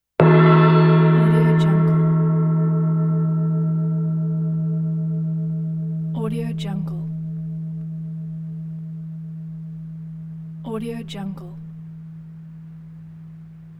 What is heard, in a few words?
gong